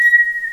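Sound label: electric piano; fairlight cmi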